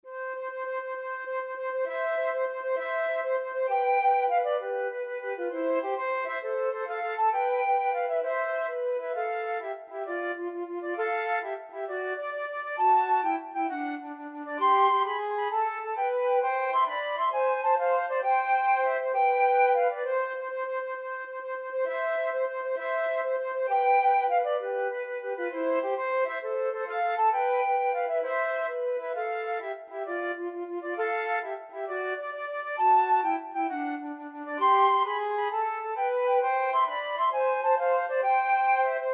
mendelssohn italian flute duet

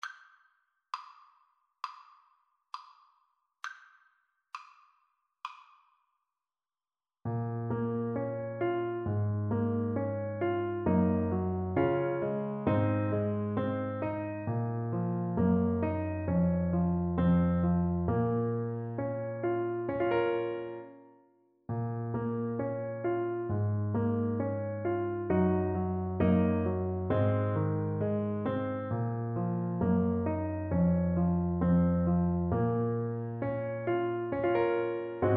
the foggy dew, Trumpet

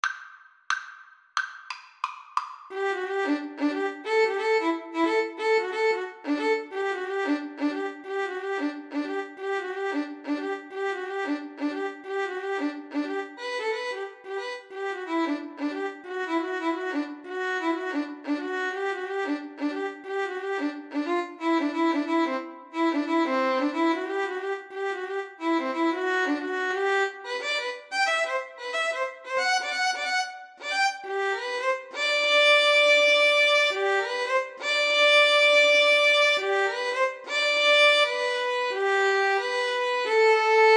violin duet

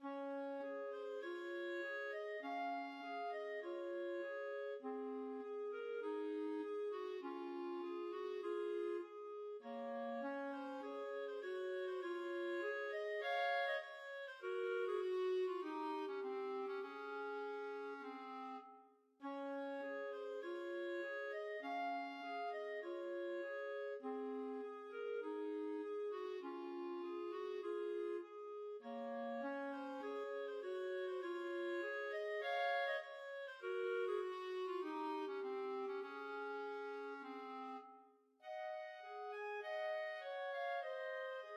un poco adagio clarinet duet

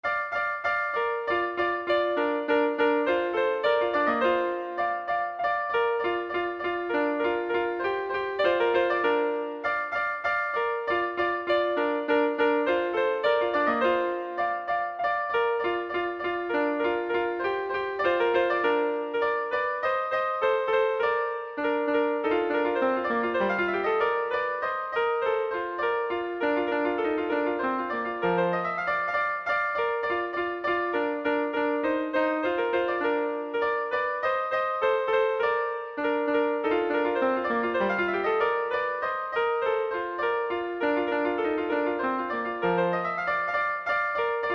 duos allegro, trumpet duet

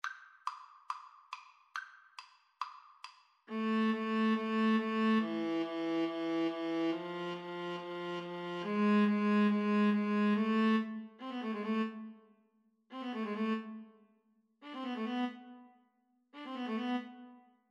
Viola Duet

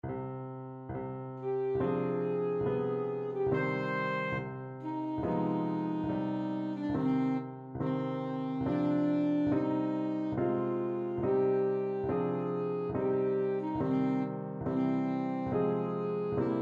saxophone; evening bells

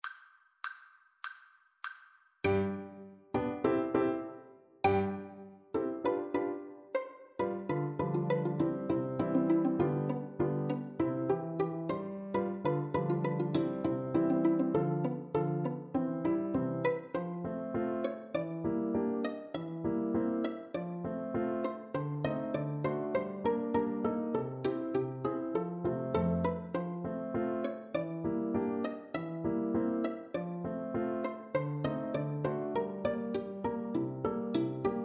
pizzicato polka, violin

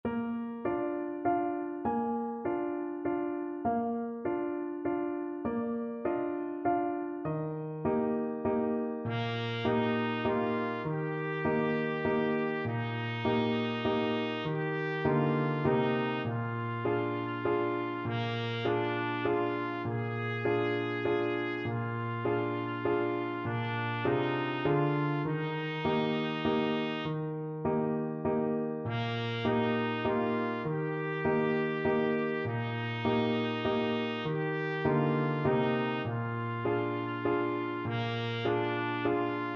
trumpet

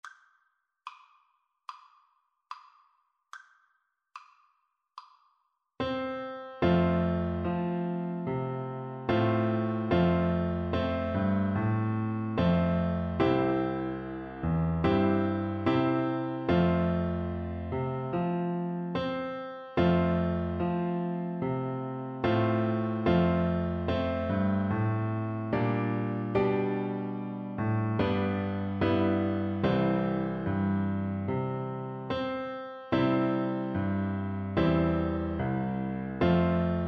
albanian nat, Flute; Himni Kombëtar (Albanian National Anthem)

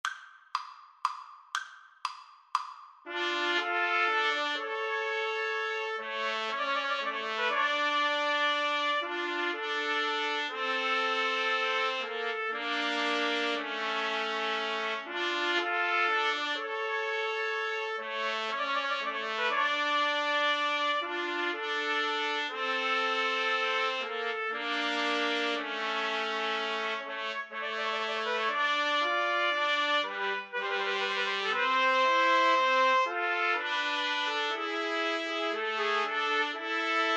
pastorale TPT trio, Trumpet Trio

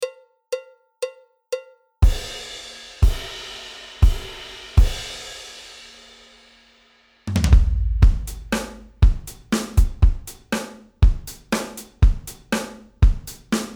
drums

